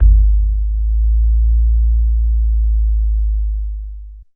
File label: Deep Bass Kick & Swell; Hits & Tones